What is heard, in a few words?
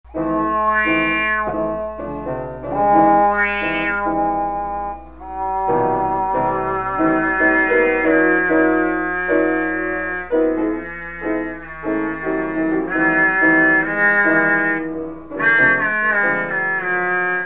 Improved Timbre Controls; lecaine; Compositions Demonstrations; Le-Caine Hugh